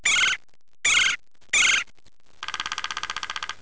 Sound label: red bellied woodpecker, songs